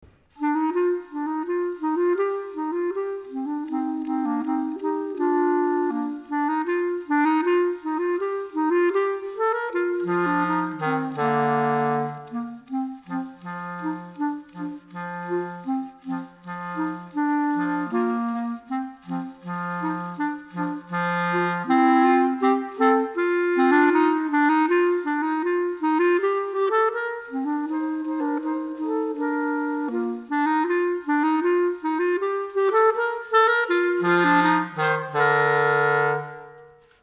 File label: Duet, Skilful Duets